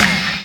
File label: PERC FX, Dance Drums